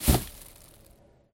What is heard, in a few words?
Death